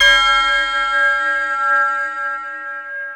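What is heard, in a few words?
FX right, attacks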